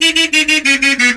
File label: horn; TrackMania United; Skins